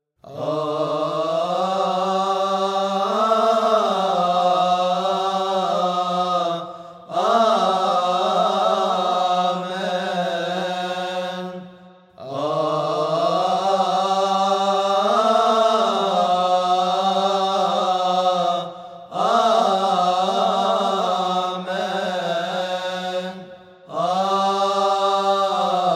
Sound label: Amen x 3; Institution Narrative Holy Body